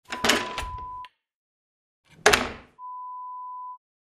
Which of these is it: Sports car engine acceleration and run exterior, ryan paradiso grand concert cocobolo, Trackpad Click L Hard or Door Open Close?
Door Open Close